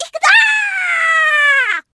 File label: Launch